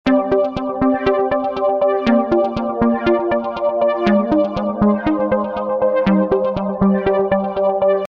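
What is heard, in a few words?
Melody C minor 120; asplosion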